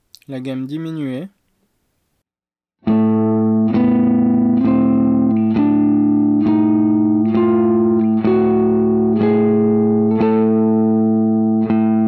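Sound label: single String diminished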